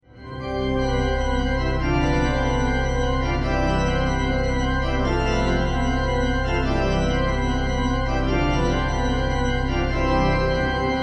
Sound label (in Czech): Toccata C dur; B. M. Cernohorsky